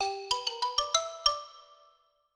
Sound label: licks; sesame street ready set grover